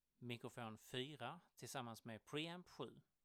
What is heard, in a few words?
mic pre